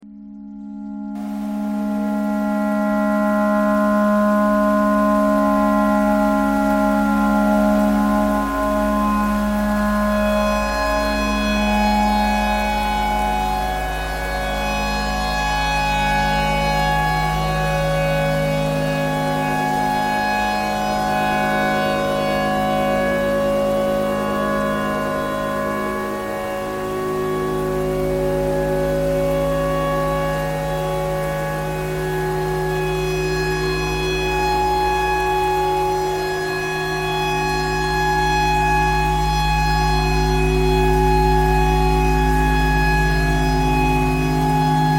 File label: tornado siren clarinet thread simple